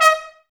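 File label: brass trumpets D#; Miroslav Vitous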